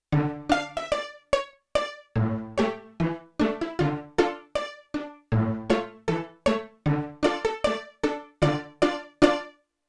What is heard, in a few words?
Pizzicato + Short Bows, Yamaha TX16W Violin Orchestra